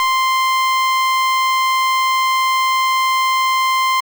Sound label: c5 saw; 混淆现象，高频里夹杂着噪音; Pro 53 C5的锯齿波